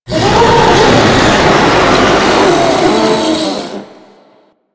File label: charge scream; Warframe; Stalker